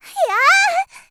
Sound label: attack; Eternal Return; Tia; Voice Line; KOR